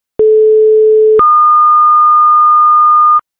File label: chief, tones